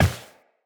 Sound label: Step Glass, Footsteps Walk; Characters; Tenno